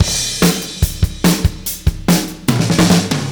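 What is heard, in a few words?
144 bpm, beat loops; Zero-G; Monster Beats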